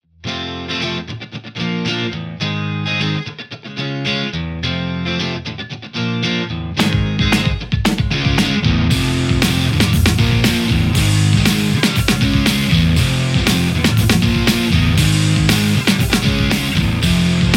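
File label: Stratocaster JCM800; mercuriall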